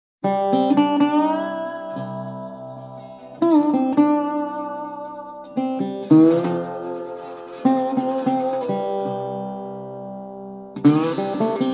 Slide, guitar

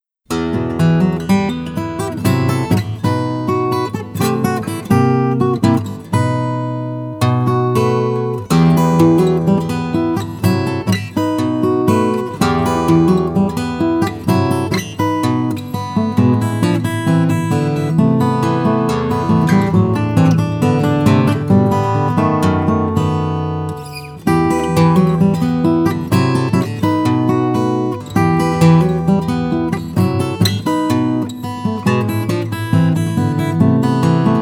Ragtime, guitar

